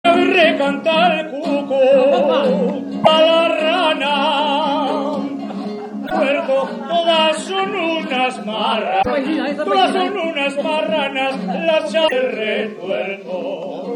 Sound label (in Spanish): Jota Solista; Y en Aguirre canta el cuco